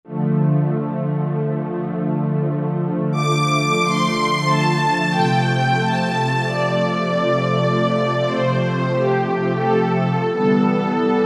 Analog Strings, Korg TR-Rack

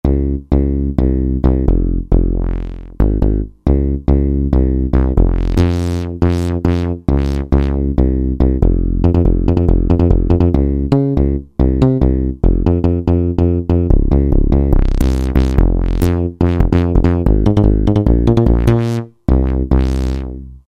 Flubber Bass, Roland JD-990; Factory Patches

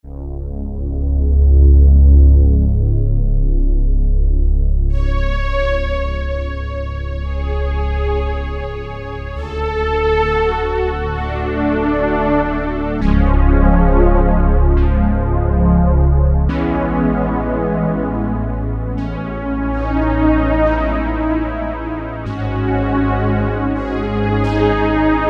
Low String Pad, Roland MKS-70

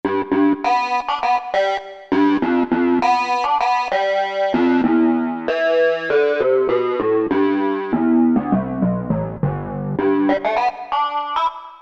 Busy Talk, Korg MSC-2S Synth; Synth 1

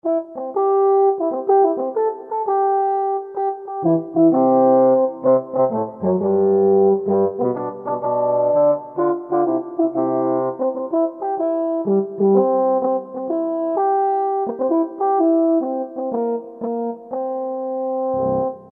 Korg T3 French Horn

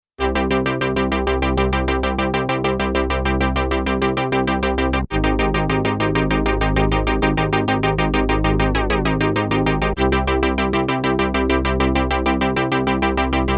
AUTO-PULSE, Korg DW-8000; Factory Patches

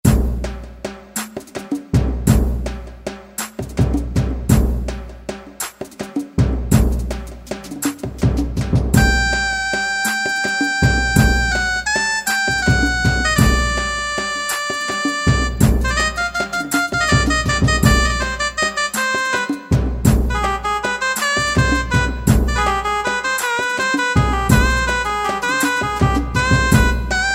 Garba, Yamaha YPT-400 styles

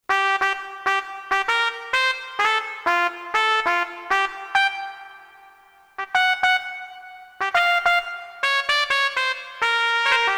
Brass TP; KSDU-002 Brass

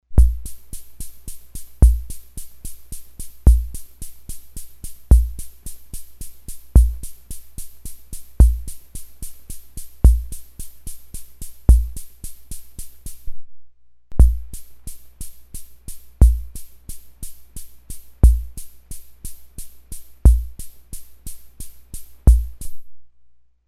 SLOW ROCK, Ace Tone Rhythm Ace FR-2L

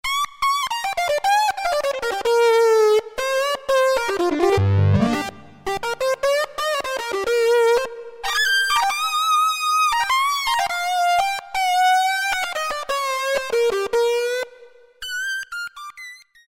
Lead Synth, Roland Alpha Juno-2; Factory A

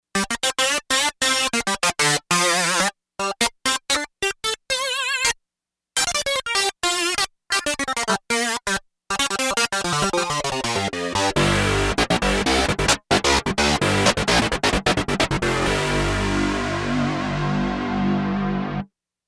Fazz Synthe-Lead, Yamaha TX802; Performances; Factory Patches

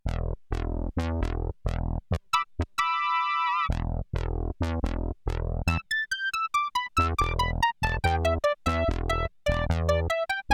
SYNTH & LEAD, SIEL DK 80; Factory

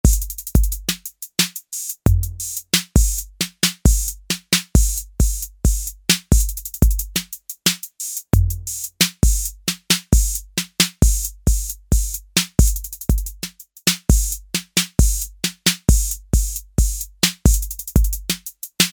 Fill in, Roland TR-808; Factory

